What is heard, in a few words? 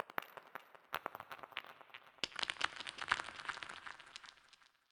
Collapse; LOOPERS